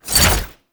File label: Whoosh, weapons; Gunsen Prime